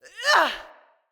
Attacks Vocals Female; Bromius; Wazard; Envoy

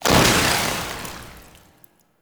Teleport Burst In; Warframe; Teshin

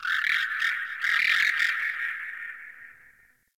Venus Landscape Distant Birds, Ambience; Warframe; Venus Open World

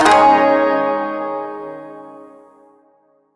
Shawzin Yo Chord B; Chords; Warframe

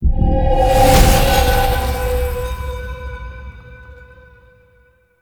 Transition In; Zariman; Warframe; Duviri

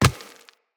Walk Rock, Footsteps; Operator

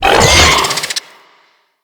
Infested Ancient Pain; Enemies

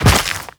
Step Dirt, Footsteps; Warframe; The New War; Characters; Kahl

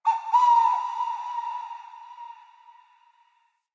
Decoy Flute Call; Powers; Fey; Saboteur

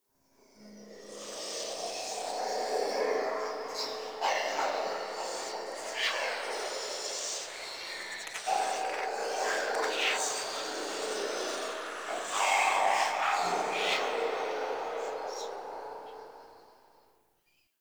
Whispers; Muruk Vull; Warframe; Ambience; Entrati

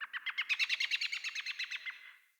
Forest Open Glade Bird Far; Day

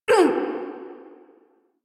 Pain, Barks; Warframe; Corpus Lich; Persona D

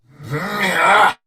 Attack Effort

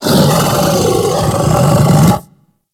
Kubrow Tame Sleep Idle Yawn B; Warframe

